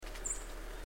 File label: sparrow